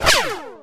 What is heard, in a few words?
attack